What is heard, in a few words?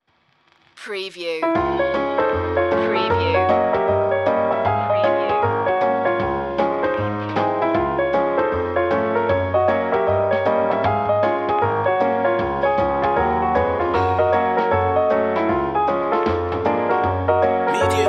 ragtime march